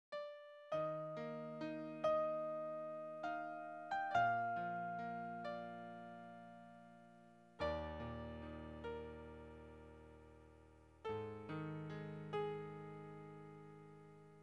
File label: jingle